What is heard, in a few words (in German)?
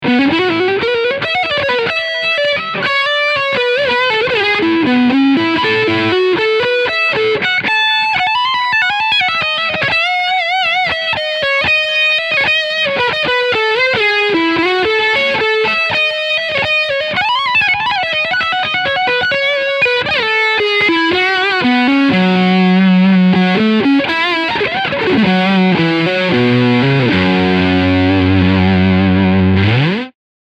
Tribute Doheny Surf Green E-Gitarre Leadsound Steg PU